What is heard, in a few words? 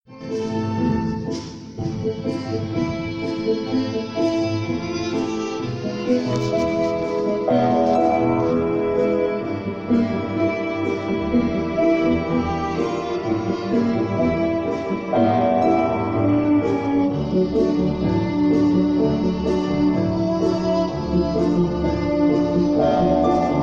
music